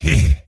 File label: Crusher Attack; AnubarCrusher